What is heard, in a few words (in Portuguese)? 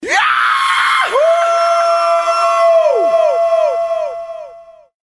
grito eeeeaaaahuuuuu